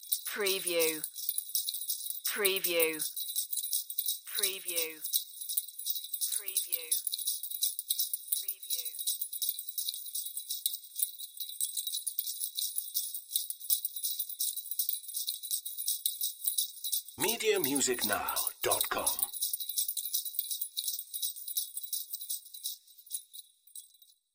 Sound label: Jingle Bells